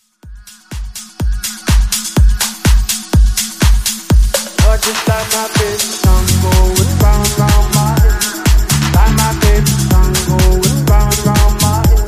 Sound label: Top40 Slap House Mashup